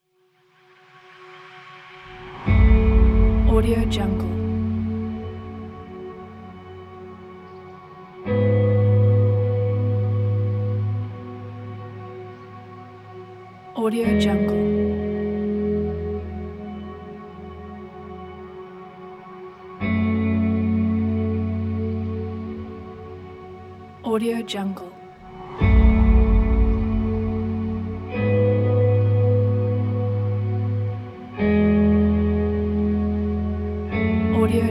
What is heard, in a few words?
soundscape guitar